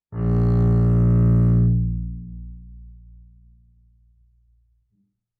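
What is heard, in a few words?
Bass arco fortissimo sul A Bb2, Double Bass; MIS Pitches - 2014; Strings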